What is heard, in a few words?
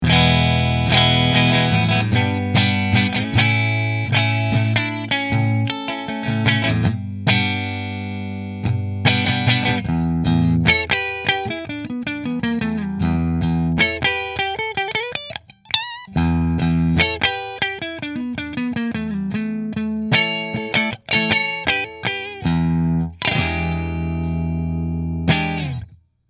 Guillfords Middle Fender, guitars